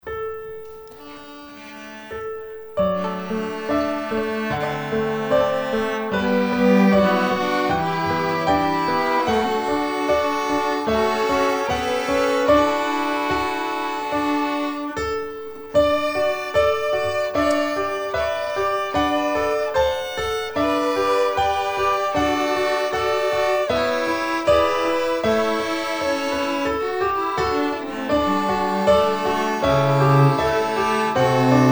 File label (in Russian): Oркестр; Церковь «Путь ко Спасению»